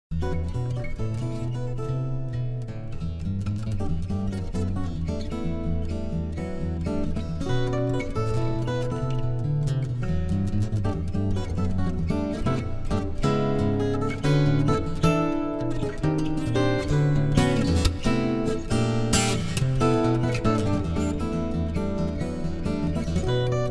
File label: Jazzy